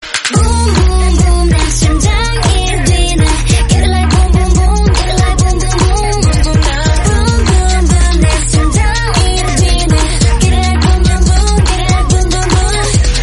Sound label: korean song